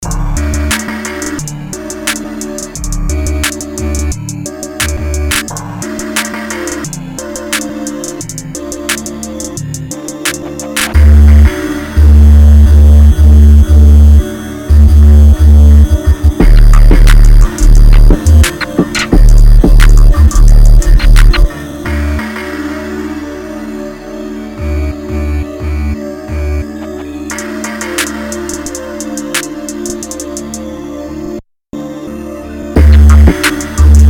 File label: hydraulic cave door